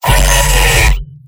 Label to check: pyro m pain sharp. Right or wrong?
Right